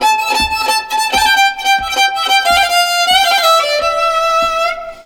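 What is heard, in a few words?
Balkanish Traditions violin; USB Soundscan vol.63; AKAI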